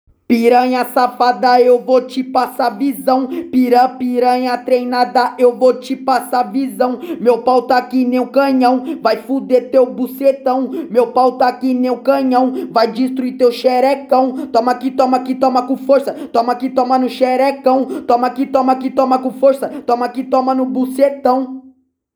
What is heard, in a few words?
ACAPELLA